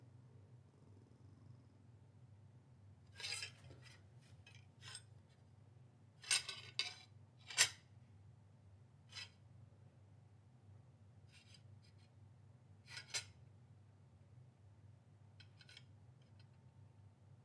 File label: Cutlery